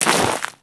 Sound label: Step Ice, Footsteps; Enemies; Crewman; Warframe; Corpus